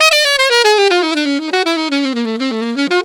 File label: Alto in Ab, Alto Sax; Sonic Foundry (Sony Creative Software)